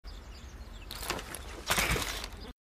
throw drone sound effect

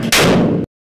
gunshot

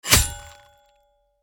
stabbing sound; huntswomen kill

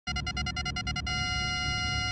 rpg lock on

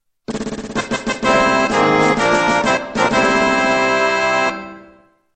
victory fanfare variation

